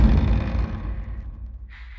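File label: Music Volcano 120; from dust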